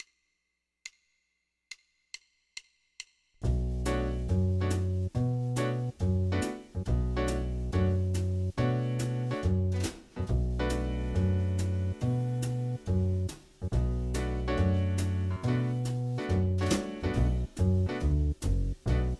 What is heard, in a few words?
Bm7 1 Chord Groove; guitar; guitar lessons